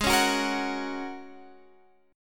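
A flat 7th Suspended 2nd Suspended 4th A flat Guitar Half Step Down, chord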